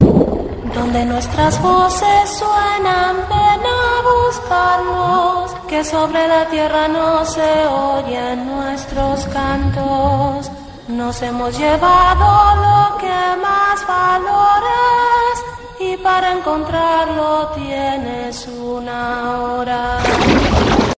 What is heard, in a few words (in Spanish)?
Canto de sirenas